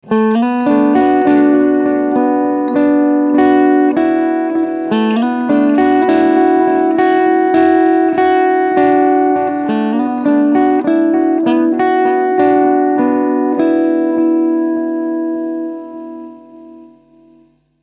Maximum Delay

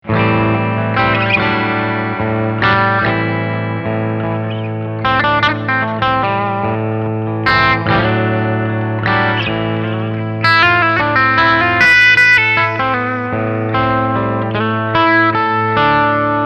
Steg HB Clean Picking

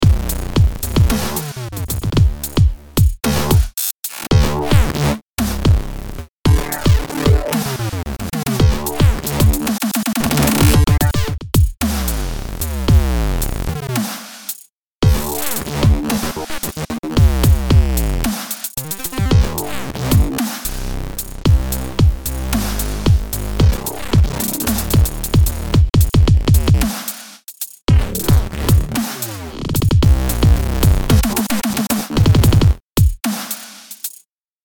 Dubstep

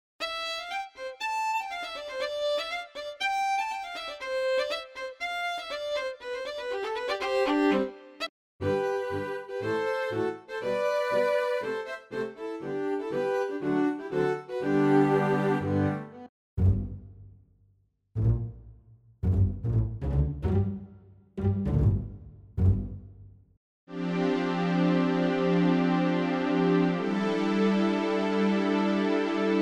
Strings

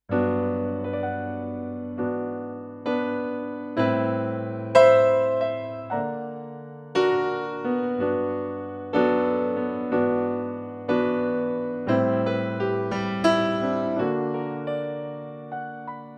grand

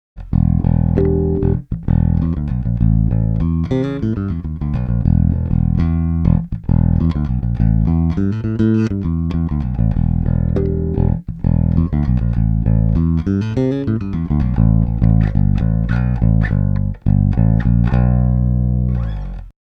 Rupert Neve Designs Portico 5017 Bass DI

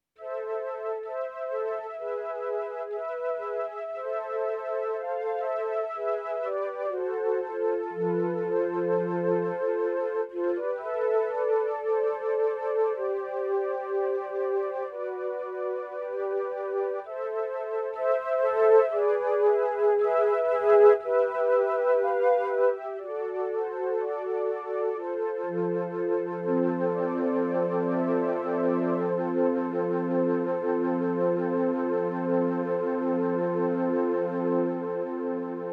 Mellotron flute plus Piano

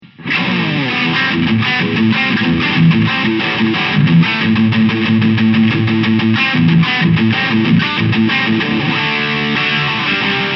High Gain

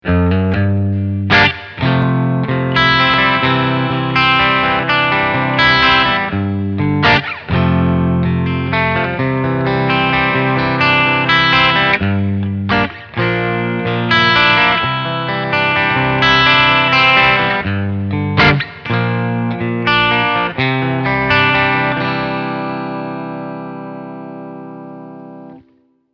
clean Blues

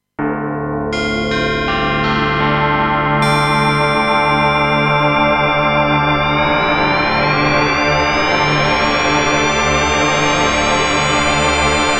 Tubular Bell Wah

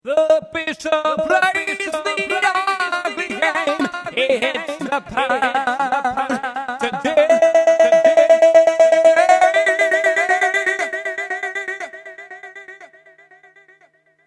Chop Saw 16ths

